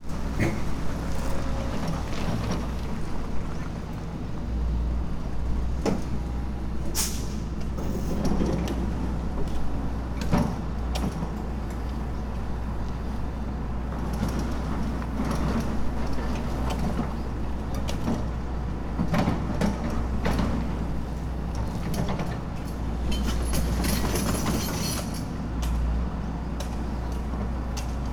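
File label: decontamination parking, Fukushima Soundscape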